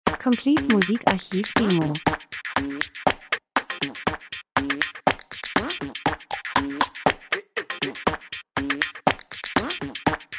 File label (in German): drums, rhythmus; Percussion Urban Jugend Beat Rhythmus Modern Industrie Spannung Akzent Dokumentation Lifestyle